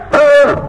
ministrider hunter found enemy

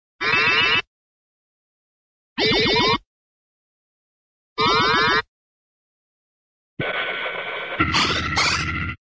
sci fi space ray gun shoot; cartoon

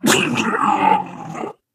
ballbot smash into wall

